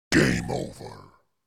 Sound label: gameover; switch; popeye